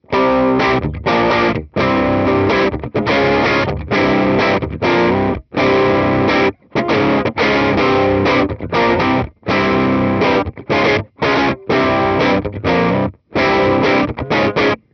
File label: Crunch; true rock tone